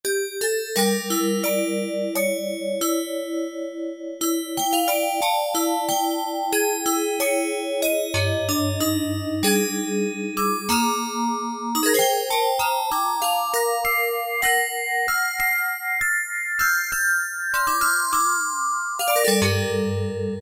TOY PIANO, Casio VZ-10M; VZ ROM Card

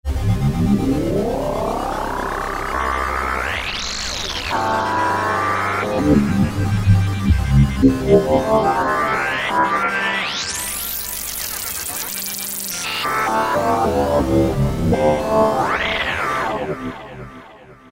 Korg microKORG Factory Voices; Diginator

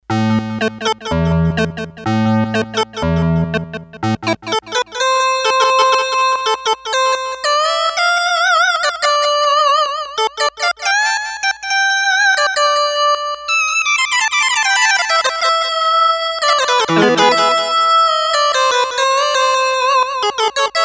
Synth Lead, Korg DS-8; Factory Patches

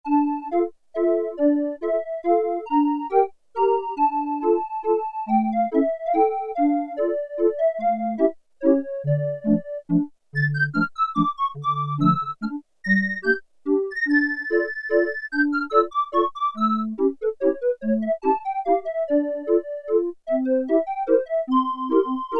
CALLIOPE, Oberheim OB-8; Factory Patches

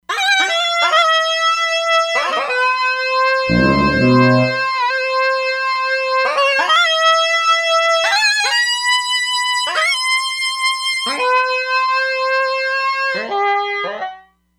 Shenai, E-mu Proteus-3; Factory patches

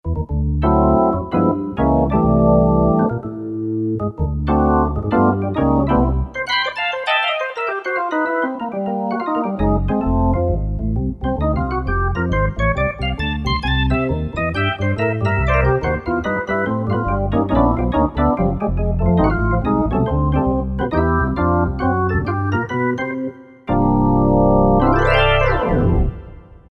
Ham and Organ, Roland PN-D50-01

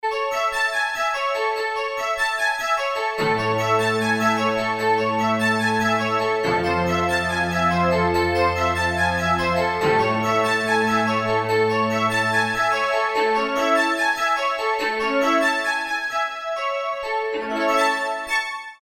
Bell Str, Korg DSS-1 Strings

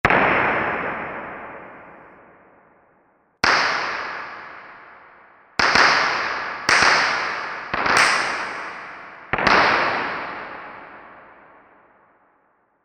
Gunshot, Korg MSC-8S Percussion

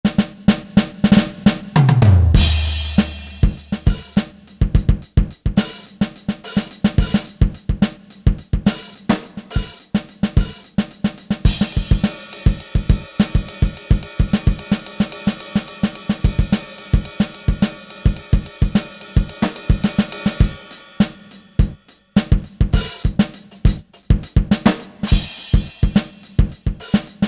Drum Kit STD'70; Generalmusic Equinox

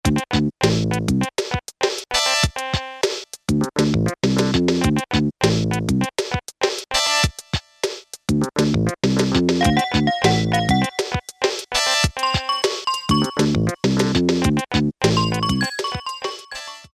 FUNK 2, Casio Rapman rap patterns

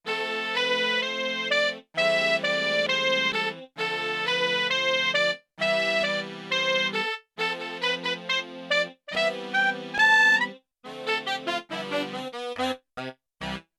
Width Chorus, Four Saxes; Yamaha TX16W

